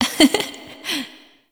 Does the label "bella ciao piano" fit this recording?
No